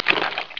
splish; mk2

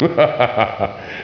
laugh; ShaoKahn

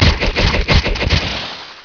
fan hit; mk2; Kitana

